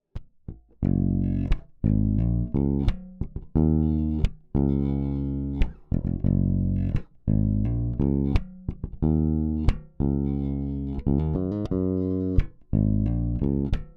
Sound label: Bridge Pickup Parallel; Lakland luxe